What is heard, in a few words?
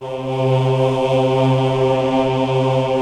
Choir; B CHOIR